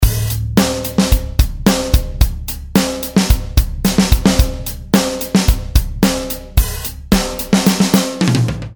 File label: Loop Multi +4dB